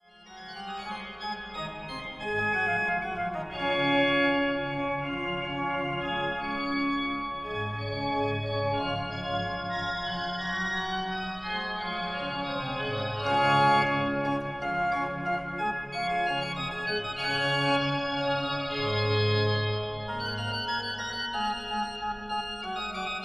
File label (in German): Orgel Concerto fuer 2 Orgeln